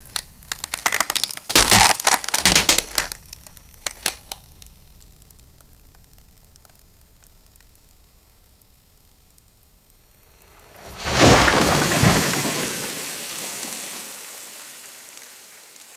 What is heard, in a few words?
slow cracking dead wood falling with thud